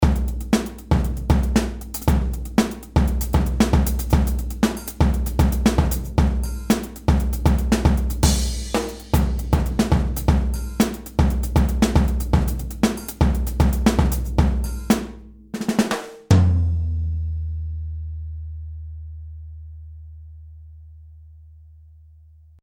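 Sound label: Funk DLX DW Black Oyster, drum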